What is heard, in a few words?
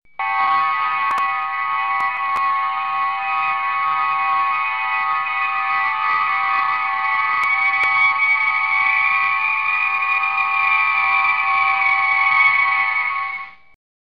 Transporter